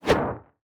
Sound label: dark mage attack